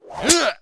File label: guard attack; propack